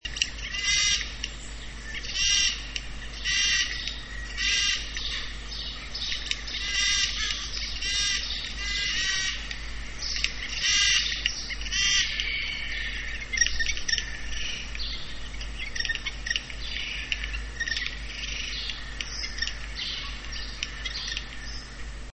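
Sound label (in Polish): sojka, krukowate - corvidae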